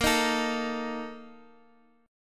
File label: A sharp Major 7th Suspended 4th, Guitar Open F; strummed; chordshape; x,x,x,5,6,4